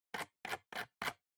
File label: PC Mouse Scroll Fast